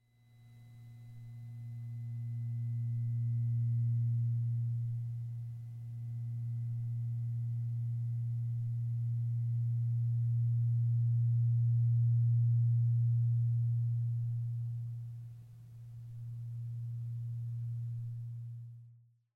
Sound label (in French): diapason pancreas 117.3 hz; medson